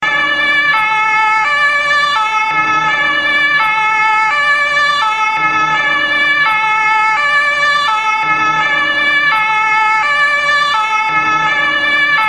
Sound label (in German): Polizei Sirene